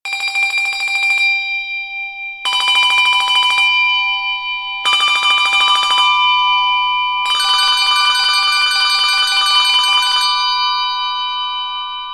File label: sanctus bells